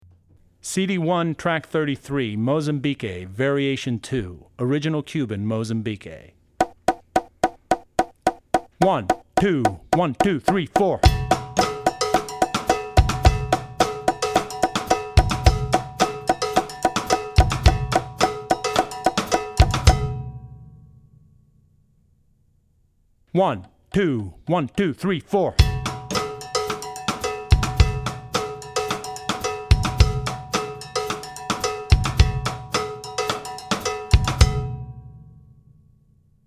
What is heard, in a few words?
Mozambique (Variation 2); Drummer's Bible Tracks